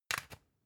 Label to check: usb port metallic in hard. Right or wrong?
Right